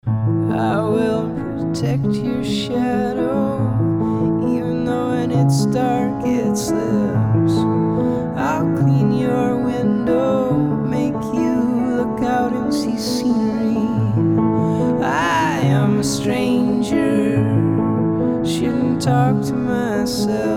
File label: Piano